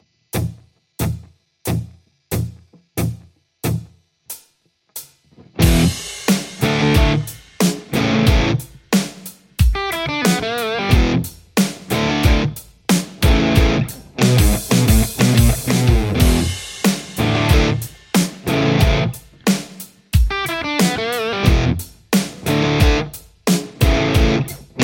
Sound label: Les Paul